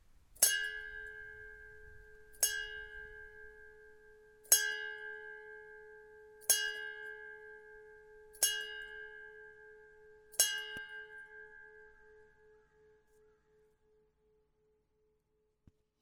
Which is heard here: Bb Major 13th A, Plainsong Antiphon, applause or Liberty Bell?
Liberty Bell